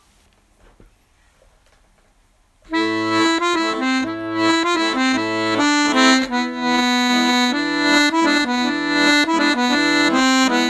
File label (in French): Valse Israélienne, accordeon